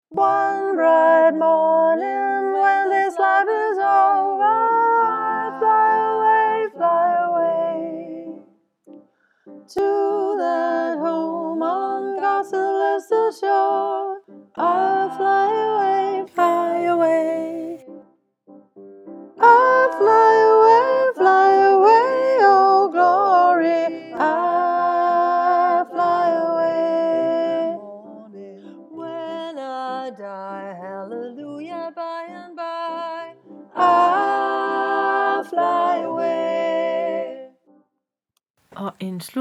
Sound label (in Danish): sopran